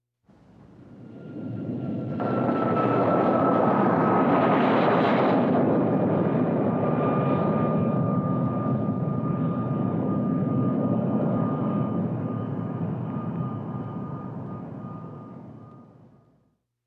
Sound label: Jet Fighter Take Off